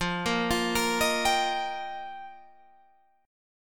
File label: F 7th Suspended 2nd Suspended 4th; F7sus2sus4; arpeggiated; 13,13,15,15,16,15